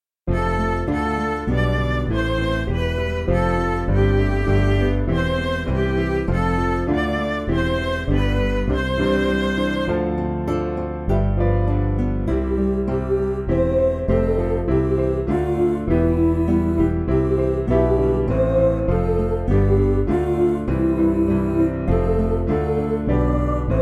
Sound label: Lord Thy Blessing Send, Midi